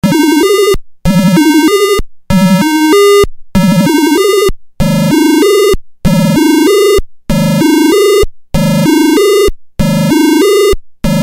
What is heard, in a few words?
beep electronic devices